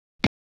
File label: FX and Scratches